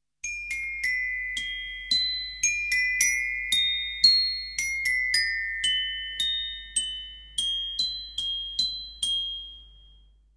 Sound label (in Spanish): Carillón o glockenspiel